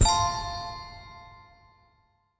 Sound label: hint